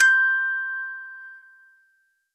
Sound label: notification